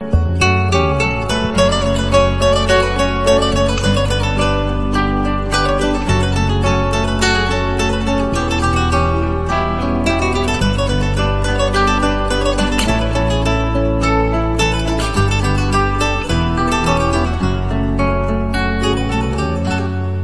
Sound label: guitar ringtones